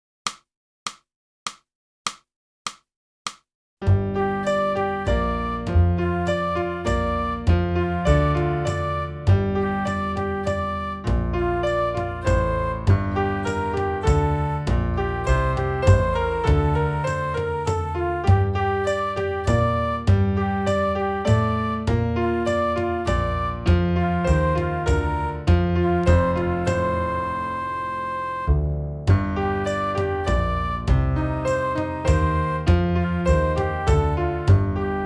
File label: sax